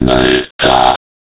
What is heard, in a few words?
pain